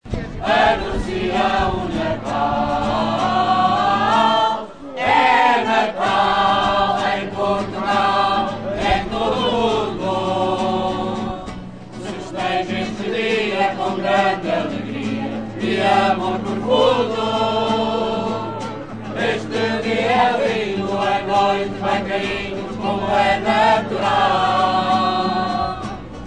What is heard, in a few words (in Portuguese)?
coro de natal; unhais